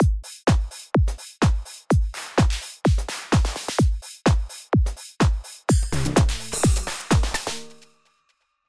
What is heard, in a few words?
punch house; rob papen